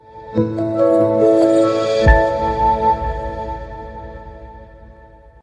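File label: relax notification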